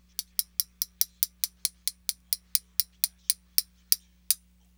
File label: Silverware; kontakt